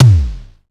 tom, Tama TS-305

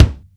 sharp k, Jazz Brush

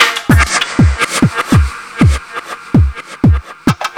DRUMLOOP, Ragga & Dub Reggae

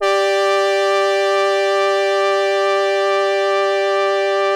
P5 5TH G4, PROPHET 5; Greytsounds VOL-1

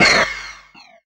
FX Dance

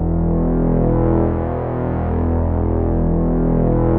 Prophet 5, F2; Hollywood Edge - Giorgio Moroder Rare Synthesizer Collection

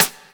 SD, snares; EastWest; Dance Mega Hip Hop Electro

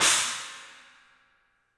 CYMBALS; 3D Sound; 3D Drums

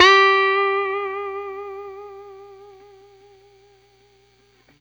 BLU BEND UP, Guitar & Bass

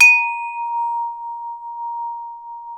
D#3 STRUCK, STRUCK CHMES; AKAI S6000 CD-ROM; WorldSounds

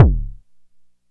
JUNO KICK; E-MU Formula 4000 Series Vol. 3; Analog Odyssey; Electro Perc

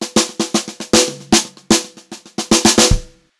152 FILL, Brushed Drum Loops; USB Soundscan vol.39; AKAI; 90 s Sample CDs

